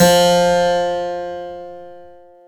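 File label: harpsichord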